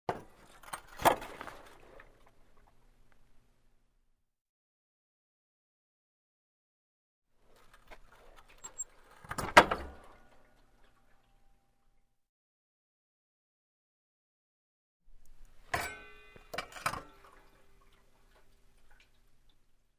Motorcycle is put on center stand

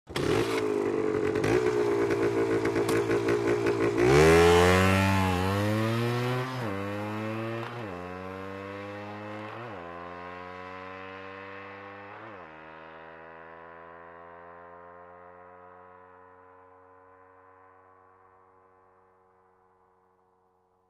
Motorcycle starts, waits, drives quickly

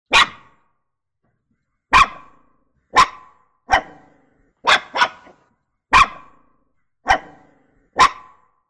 little dog

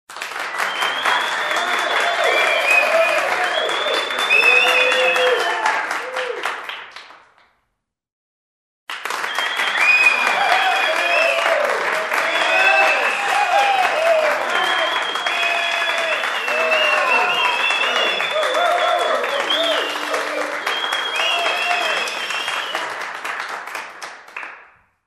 Applause from a small crowd indoors; standing ovation

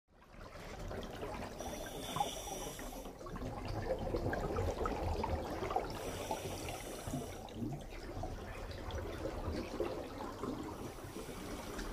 Diving, a man swims underwater

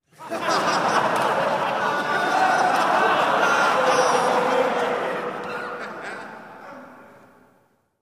Laughter of a small crowd

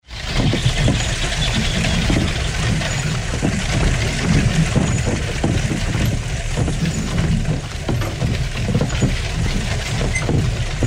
Riding inside a carriage; horse

